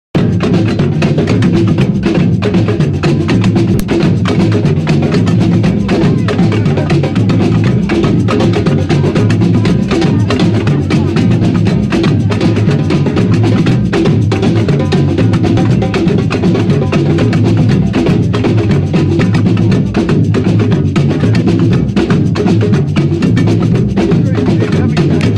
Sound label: onfield at Bromyard Folk Festival